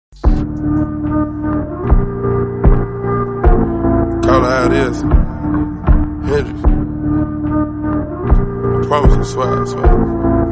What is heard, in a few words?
ear rape